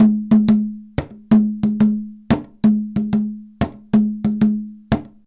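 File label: emelabo grooves; Best Service; World Colours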